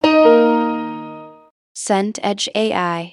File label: piano note soft